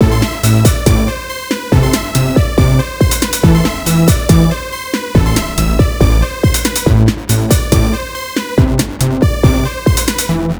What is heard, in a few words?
loop